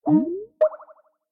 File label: call answer